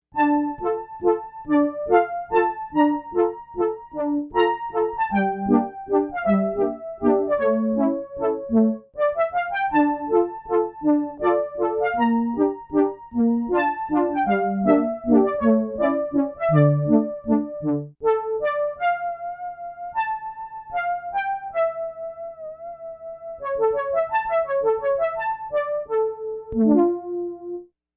Arpegg Flute

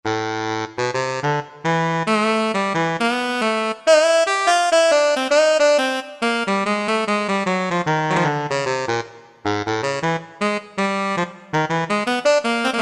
Tenor Sax, Roland JD-990; Factory Patches

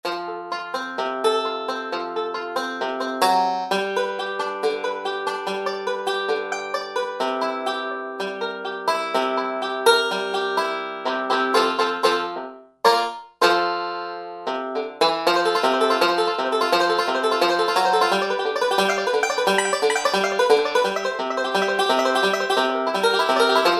Banjo, Korg TR-Rack; Banjo Andro

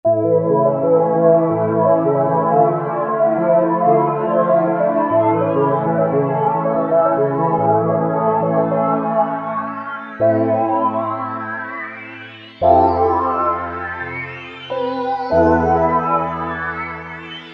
INVERT FILT, Akai SG01v

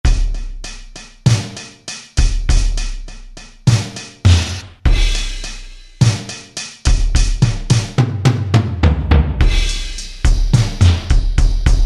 15ips Kit, Alesis QS8; Factory Preset examples

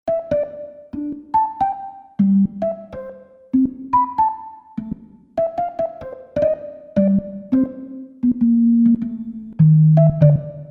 Mellow 4', Roland SRX-07 Ultimate Keys

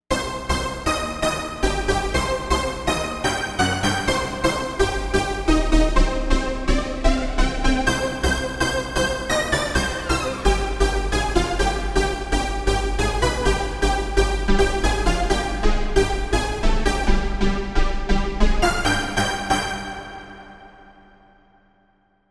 Stab, Novation UltraNova; Factory Patches

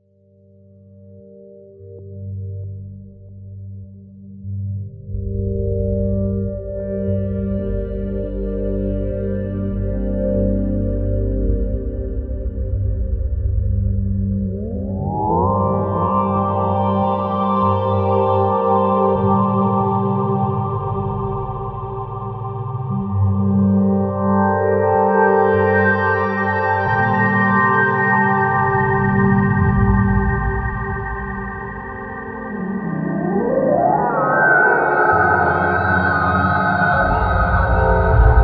Ambient Hold, Roland V-Synth; Factory Patches

